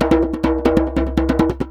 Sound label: Djembe loop 140 BPM; musicradar